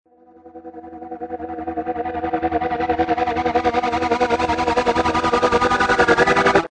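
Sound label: Dark Tech Guitar A 145bpm; Surround Session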